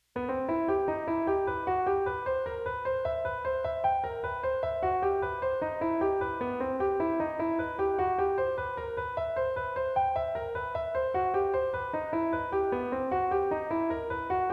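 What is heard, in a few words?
Major Seventh Chord Embellishment, jazz piano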